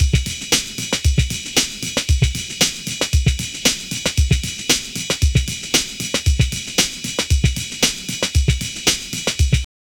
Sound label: classic styled amen break, 115 bpm